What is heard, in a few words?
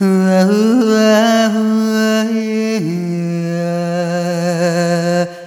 Arrhythmic F